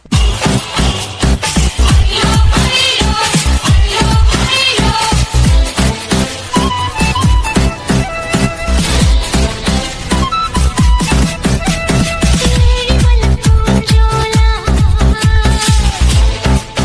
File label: malayalam music ringtone